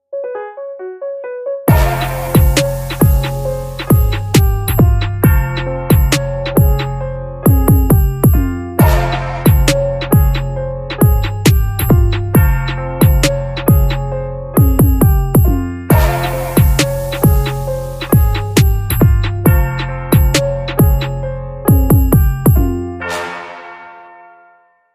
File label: marimba remix ringtone